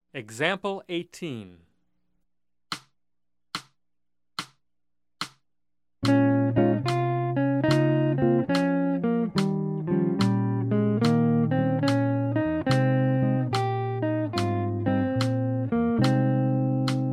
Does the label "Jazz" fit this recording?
Yes